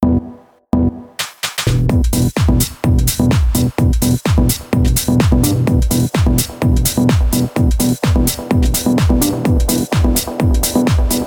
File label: House ring